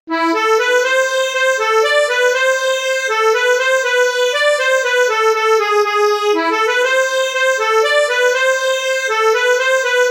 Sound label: Mazurka